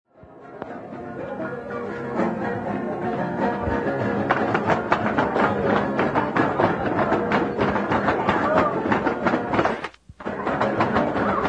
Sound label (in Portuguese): Danças, Dança de São Gonçalo; Cubas Mogi das Cruzes SP